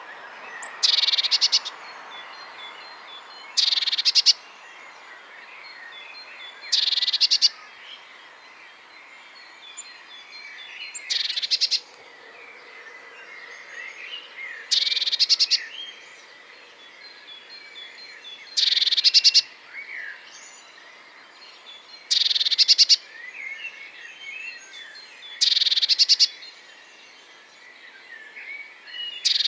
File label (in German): blaumeise gesang; vogelstimmen; nabu; lars lachmann